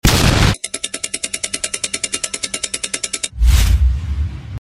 爆炸

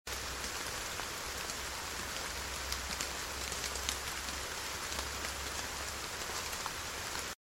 场景一背景音

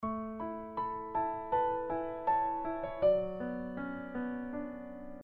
回忆背景

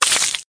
泼酒

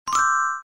点击茬点音效

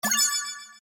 接到红包

